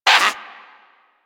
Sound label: Bird Tranq Dart Grunt; Warframe; Hunting Critters; Sawgaw